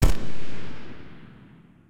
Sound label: shoot; Alawar; Crusaders Of Space 2